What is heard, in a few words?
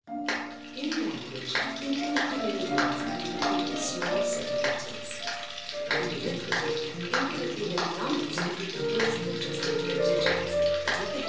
mix small